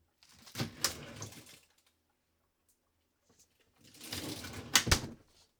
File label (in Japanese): 冷蔵庫を開ける